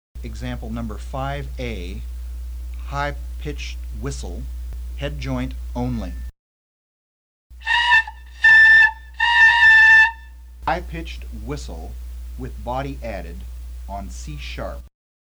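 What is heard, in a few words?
Embou FL, Flute; Blowing much too hard. Blow less and more directly into the hole. Headjoint rolled in too far, causing the hole to be covered too much.; EXAMPLE #5a–HIGH PITCHED WHISTLE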